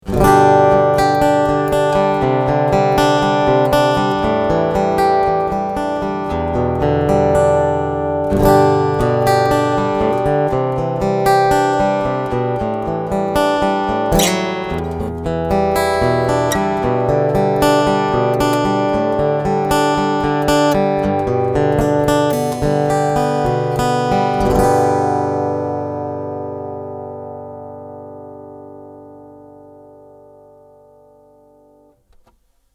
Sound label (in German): eq full mic beide